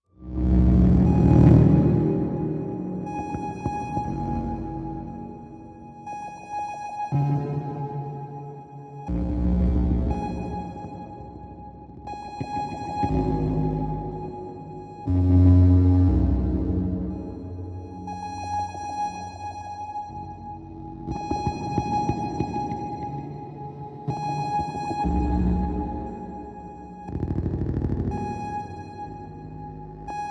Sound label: Random Electro Pulses High Tones; scanners; Come in Please Random Electro Pulses High Tones